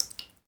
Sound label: drip, water; World Of Goo